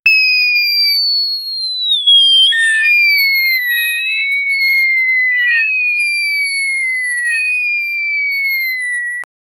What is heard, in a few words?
crime child sound effect